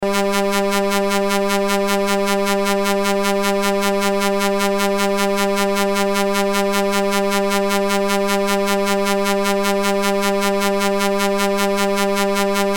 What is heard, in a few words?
Noise Saw Wave Buzz Hum Electrical Pulse; Noise Saw Wave Buzz Hum Phaser High Pulse; Blastwave